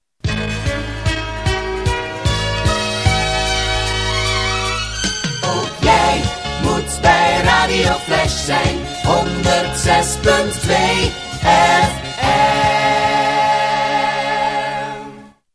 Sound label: jingle; Flash